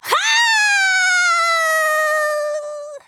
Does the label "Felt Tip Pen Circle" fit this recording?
No